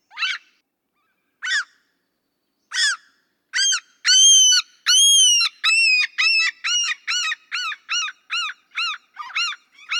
Mew Gull

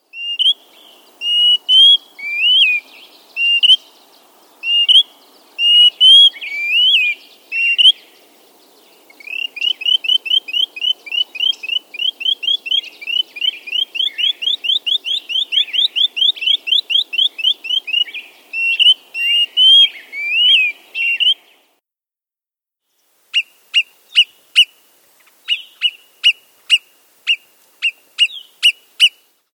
Greater Pewee

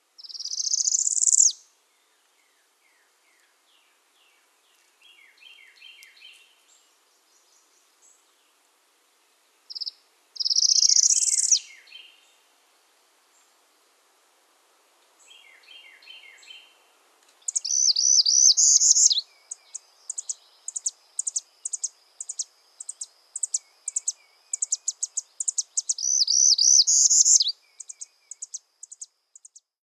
Northern Parula

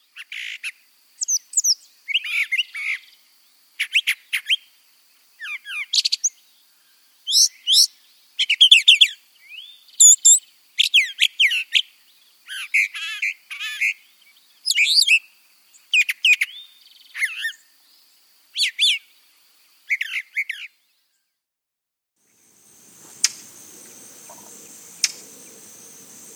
Brown Thrasher

